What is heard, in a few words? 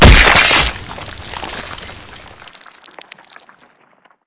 body splat